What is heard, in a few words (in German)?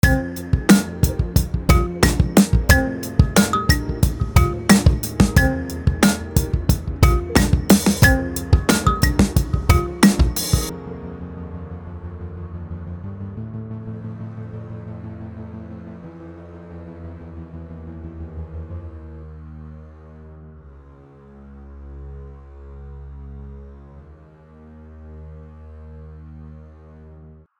HIPHOP BEAT GRUNDGERÜST; Lino Casu